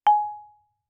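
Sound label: Marimba cord fortissimo Ab2; Percussion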